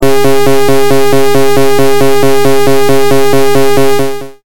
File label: machine